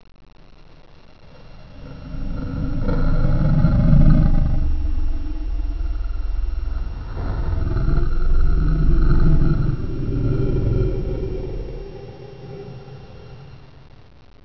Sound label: monster; eclipse